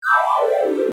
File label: failed; Snake; Game Demo